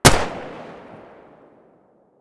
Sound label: m1911 muzzle mono distant, weapons; colt